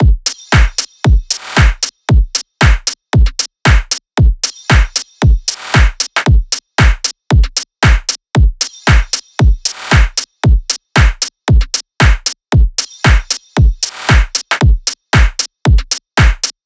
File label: drums 115, Full Drum Loops; Slate; SEISMIC Drum Sample Pack